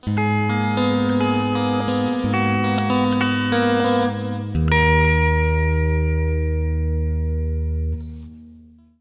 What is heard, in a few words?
Guitar Coda